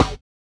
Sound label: GLASS; Spectrasonics; Metamorphosis; GLASSBABY